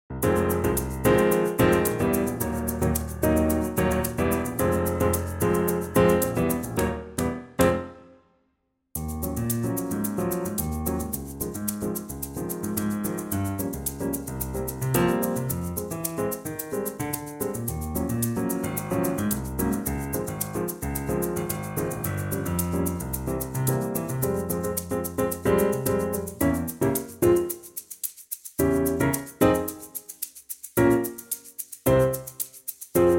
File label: Piano trak; Fm Pianotrak; key - Fm - vocal range - C to Db; Very cool piano and percussion arrangement